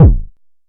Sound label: analog attacked, Kickbank